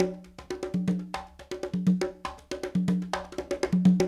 Latin Congas, African Contemporary; Spectrasonics; Supreme Beats

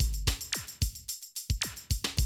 Classic DrumLoops, 110

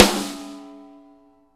SN AMBIENT, Real Mega Drums

